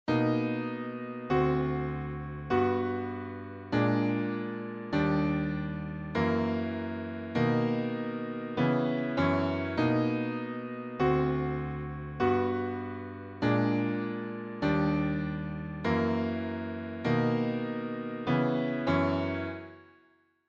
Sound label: Back Steps Piano Desc Maj 3rds